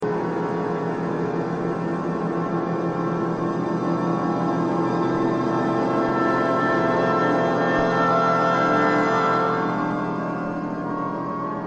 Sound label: Atmospheres